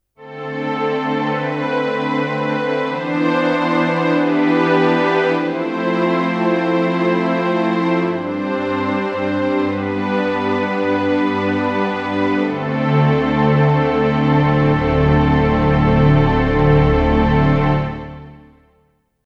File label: GP300 Strings